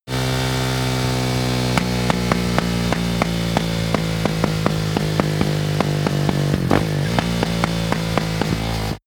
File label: motorcycle motorcross decelerate clatter